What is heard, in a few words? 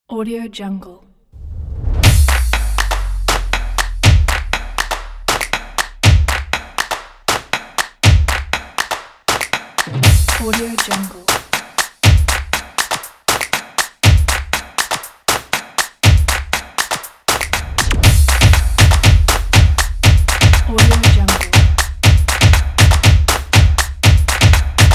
drums on